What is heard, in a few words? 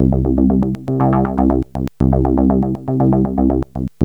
moog seq; AMG - Vince Clarke Lucky Bastard